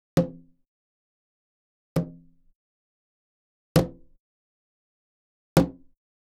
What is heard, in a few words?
Gamelan; Propeller Island - Complete Gamelan